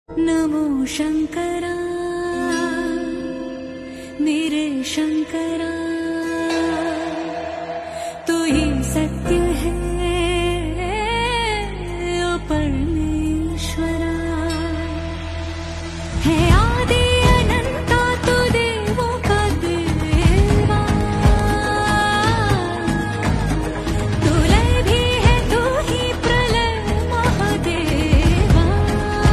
Ringtone

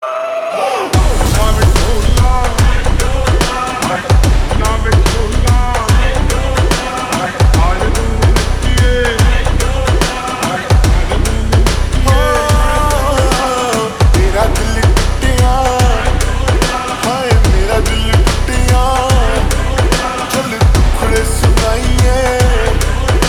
Ringtone Slowed Reverb

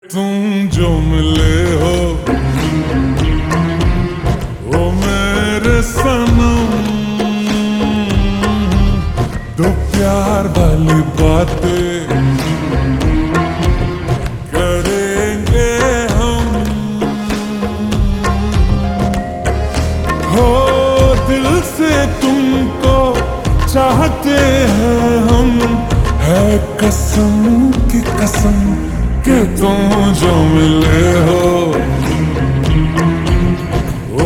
Ringtone Slowed Reverb

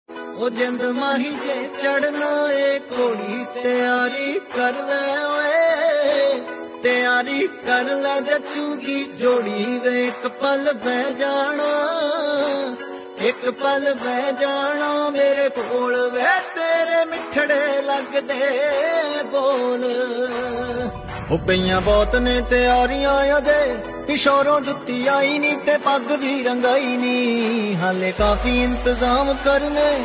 Ringtone

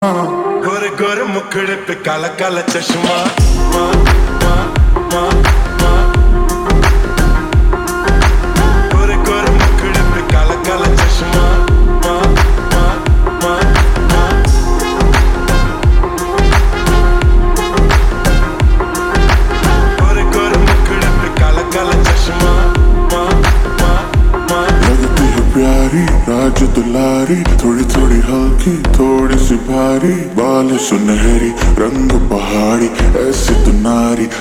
Ringtone Slowed Reverb

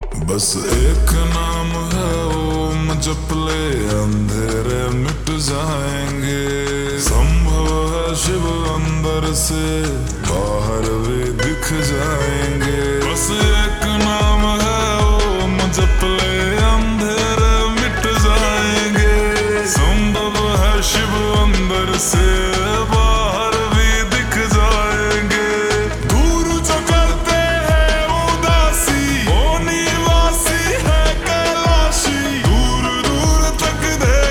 Ringtone Slowed Reverb

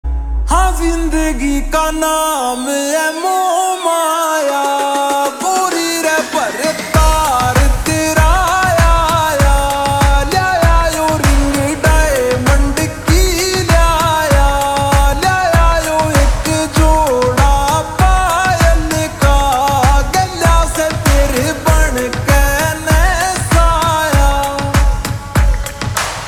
Ringtone Slowed Reverb

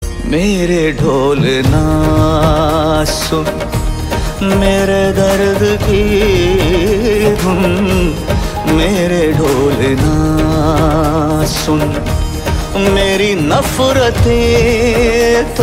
Ringtone; bhool bhulaiyaa 3

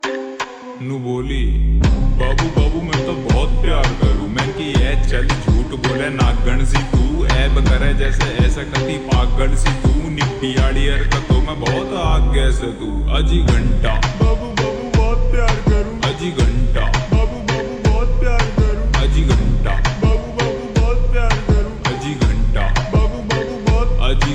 Ringtone Slowed Reverb

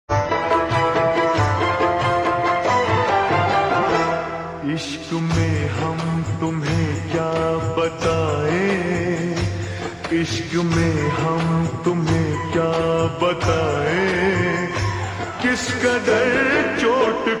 Ringtone Slowed Reverb

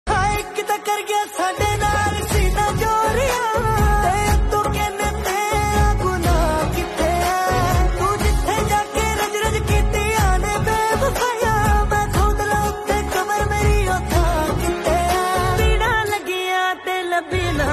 Ringtone

